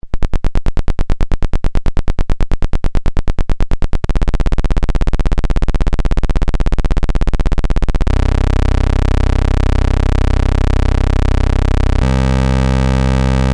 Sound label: Pulse 2 Osc 1 Pulse